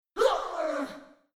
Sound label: Princess KnockBack VOX